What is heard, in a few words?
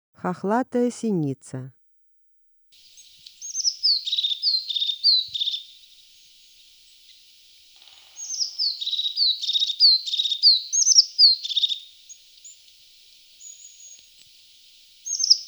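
crested tit voice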